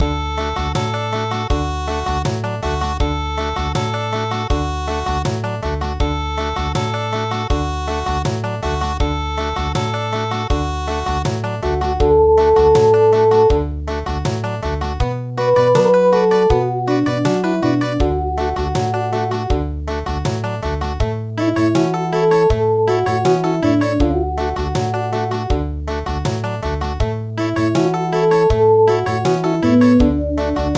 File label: Country, midi; A Sing Sing So